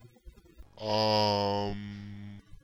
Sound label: old humm